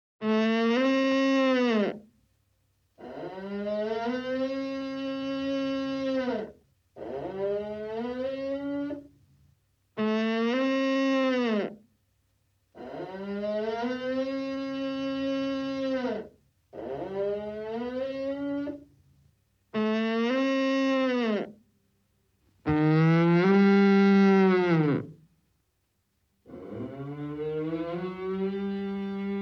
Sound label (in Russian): losi golosa